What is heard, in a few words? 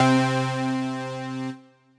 Synth Keys; Switched On DCO; Ableton; Singularities